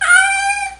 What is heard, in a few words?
3 syllable sad whine 1426Hz, cat; sylvester the cat